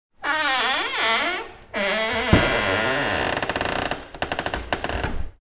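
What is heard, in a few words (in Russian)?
ворота в гулкое помещение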